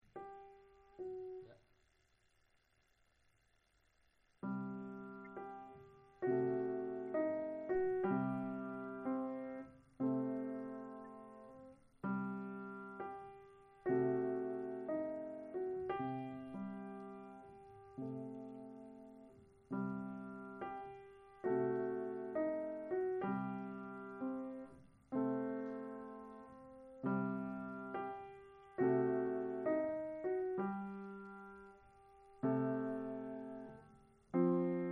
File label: piano impro